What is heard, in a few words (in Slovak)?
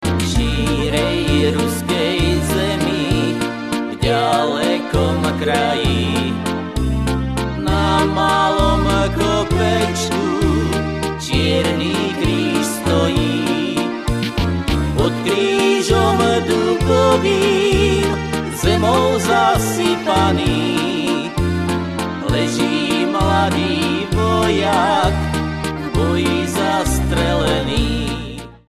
akord